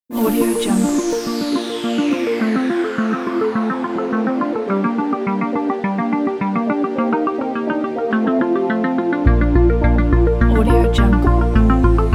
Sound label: synthpop